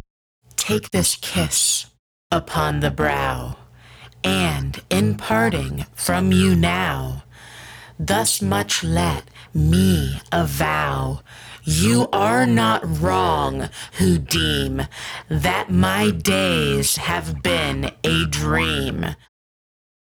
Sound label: Vocals